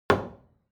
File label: impact wood staff indoors